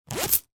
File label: coins; Money